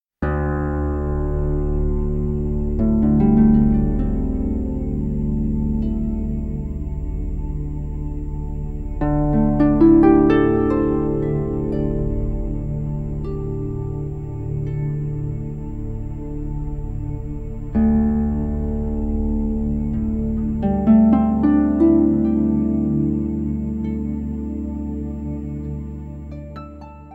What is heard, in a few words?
Harp